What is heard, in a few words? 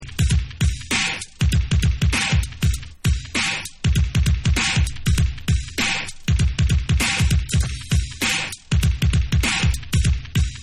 Instrumental Version